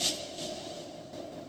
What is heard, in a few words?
Industrial 160